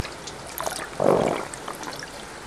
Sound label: Oncorhynchus tshawytscha Gill Bubble